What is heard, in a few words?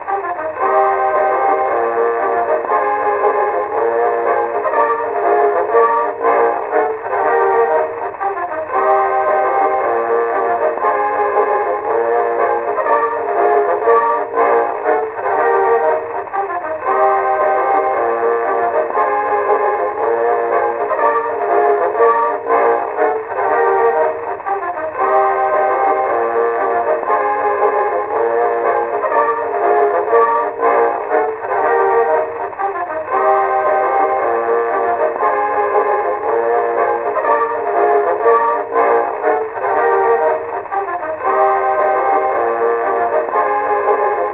Amb Marching record